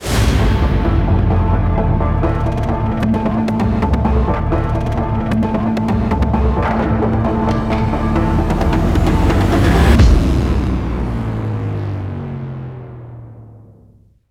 Multiplayer Downtown Start; modern combat 5 blackout; android

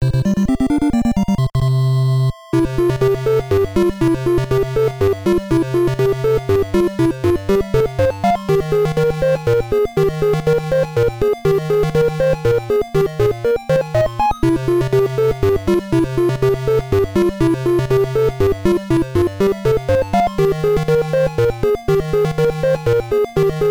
Victory Fanfare; the magic of scheherazade; nes

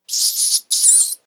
rat squeak

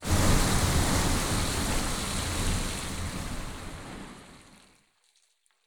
pool fill; Kismet

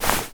run foley

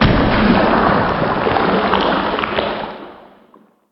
Slime Beam Big Start

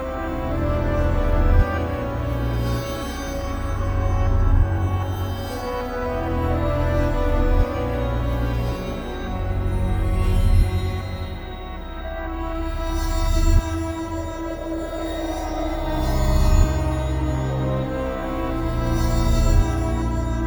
Super Secret Room Loop; The Binding of Isaac Repentance

